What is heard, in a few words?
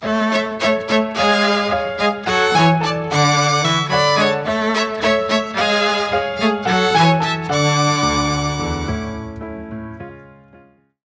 string intro live